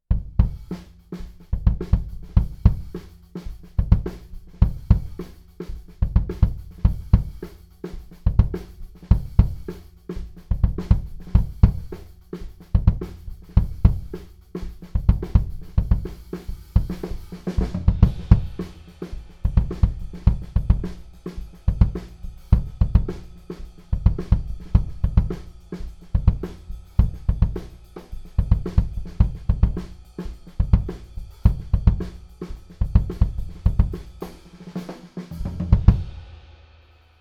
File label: KICK